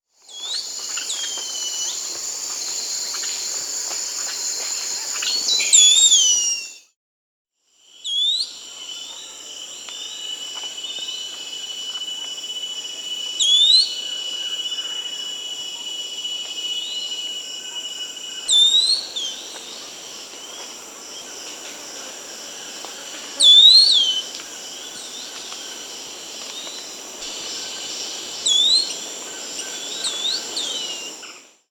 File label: Pink-throated Becard (Pachyramphus minor), Peru; Birds of the Amazon; S. America